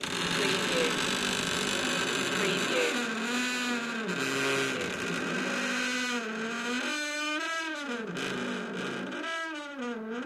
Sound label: creaky wooden door